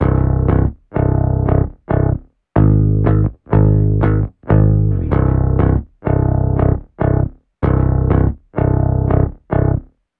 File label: bass DI preset off; df-xcite 2; drumforge